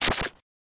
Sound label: splunch; sorcery